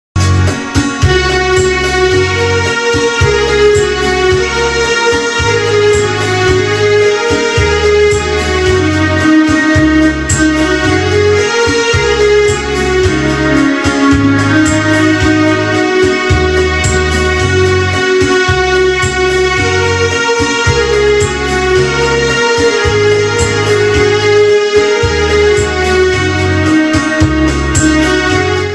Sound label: New Romantic Instrumental Song; ringtones